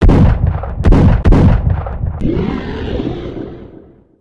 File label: shooting; Ambient; Zomboid; Random Sound Events; Stalker